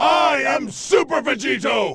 scream; vegetto

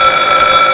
fire alarm

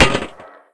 grenade hit; scmod

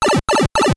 pipe travel; super mario bros

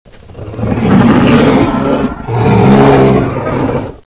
alert, leviathan

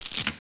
pl pain, player

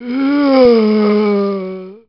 zombie die, male

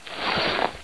crawl

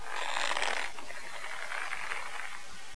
climb rope

